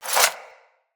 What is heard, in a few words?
Unequip Dual Daggers; Weapons